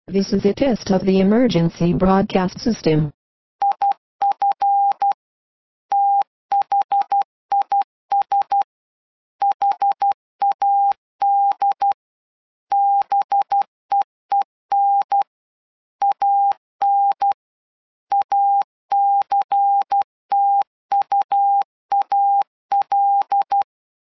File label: morse